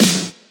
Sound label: Snares; Vengeance - Essential Clubsounds Vol.4